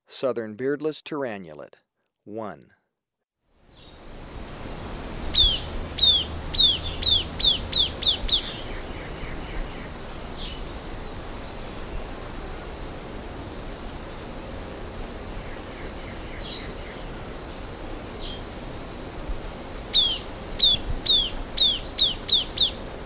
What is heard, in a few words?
Southern Beardless Tyrannulet; S. America